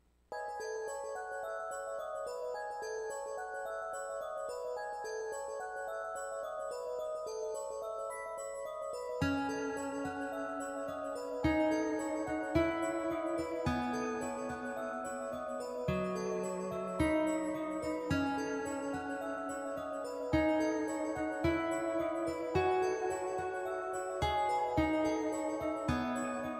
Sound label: Fountain music; unused